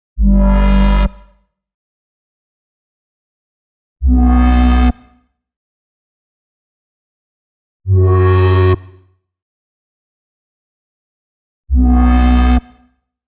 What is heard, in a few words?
125 Bm Bass, electro synths; Prime Loops; Complex Electro Synths; Focus